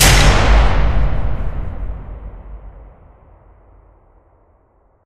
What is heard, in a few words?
light power on; Source Engine; Portal 2